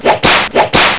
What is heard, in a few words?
ZWEEP; Zoemtonen hisses pingen woesjes tikjes